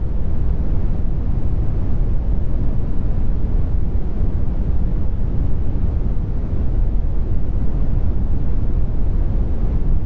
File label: wheels